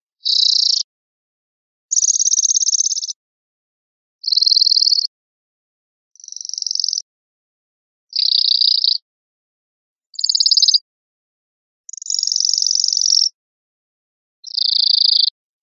milleras

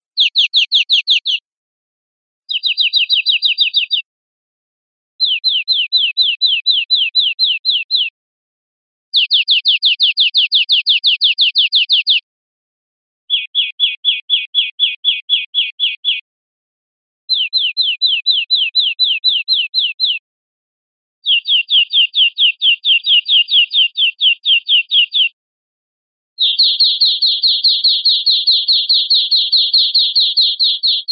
golpes corridos tiotio

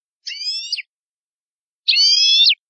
quejas simples

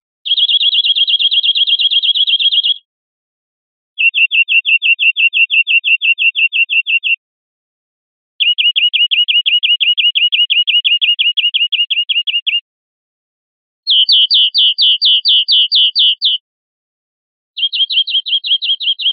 chones corridos simples

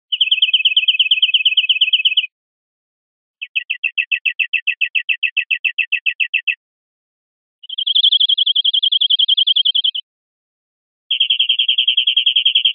chorros golpeado total

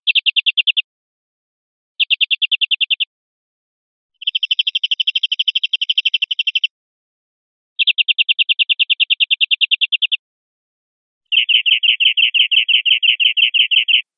troteras

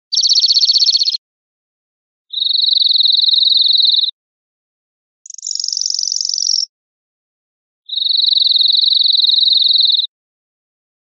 chorro amillerados

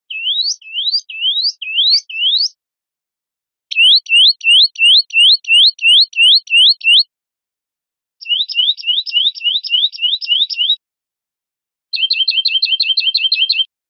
piada celuna

